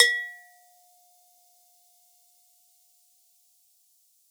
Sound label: Bonang Para EQ, Gamelan